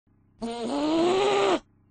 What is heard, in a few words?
angry roblox pizza place; Memes